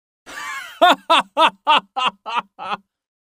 Laugh Male; Vocals & Wallas; 1001 Sound Effects

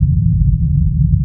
Low End Rumble Loop; Low End Sweeteners

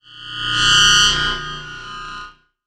Radio Hit Digital Bull Horn; Transitional Elements; 1001 Sound Effects

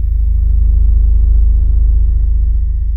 Sci Fi Drone Loop

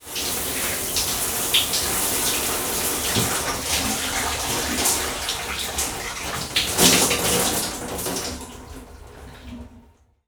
Shower Off; Bathroom

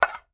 Plastic Plate, Kitchen

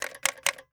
Telephone Check for Dial Tone; Communications

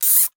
Cable Tie; Tools

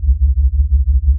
The Main Reactor Loop, Sci Fi

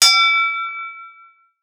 Bell, Metal

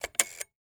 Telephone Rotary Dial

